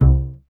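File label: STR CBS, Solo Instruments, double bass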